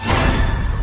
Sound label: jumpscare; zomboids